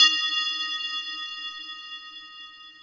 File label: bel